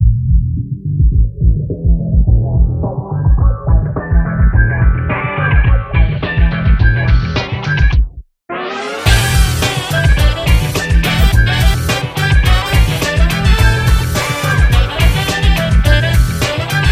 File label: Hip Hop; This Is Hip Hop